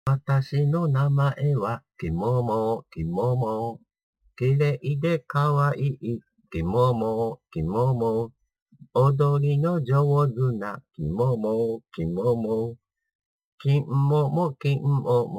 歌

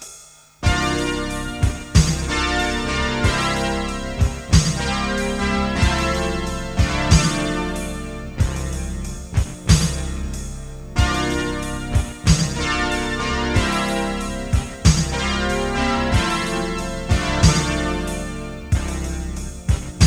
CP-35音色

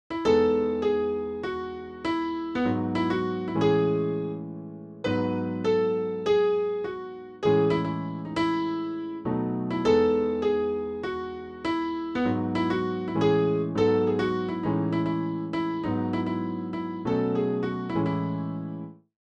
編曲依頼 出だしのところだけ

